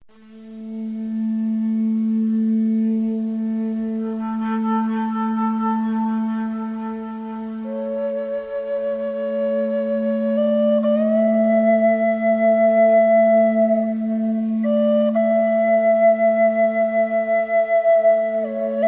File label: cold wind, flutes